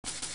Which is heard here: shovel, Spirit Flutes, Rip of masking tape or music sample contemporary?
shovel